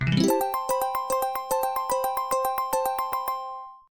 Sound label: game win